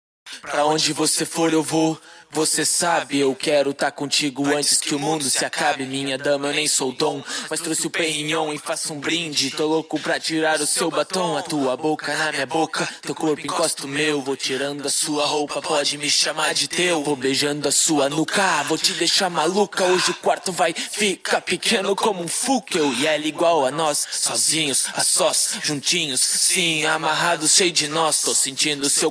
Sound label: rap 86 Gm; acapella